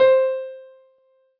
Piano Ff

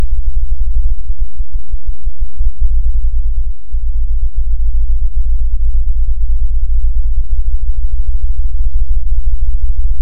Subliminal Stress Waves

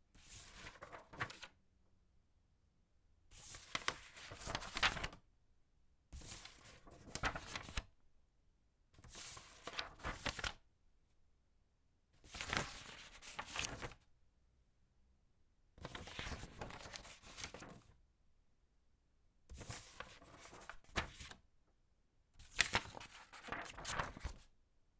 Paper Being Turned

